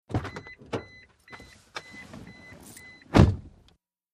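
Mitsubishi Montero interior: Getting into vehicle, with warning beeps; VEHICLES MITSUBISHI MONTERO: INT: Getting into vehicle, with warning beeps.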